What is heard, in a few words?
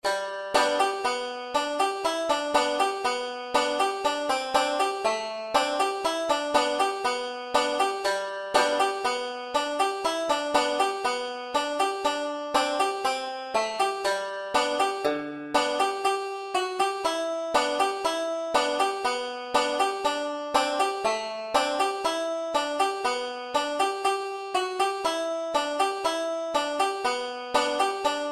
banjo solo buffalo girls g, folk songs, banjo songs; buffalo gals